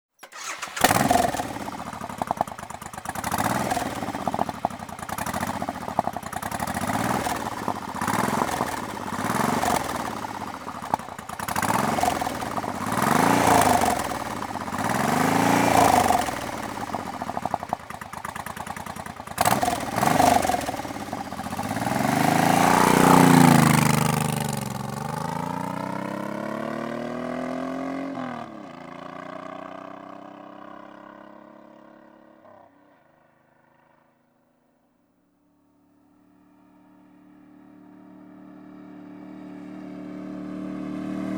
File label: Suzuki VL 1500 Intruder